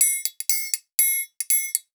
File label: 120 TRIAN, Percussion Loops